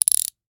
Rachet, vanadium steel, 8in, standard, locking, clicking, mechanical, new, socket, rotate, quick; Eiravaein Works; Vaeyan IV